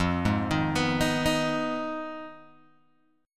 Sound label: F Minor 7th Flat 5th F down Guitar Open G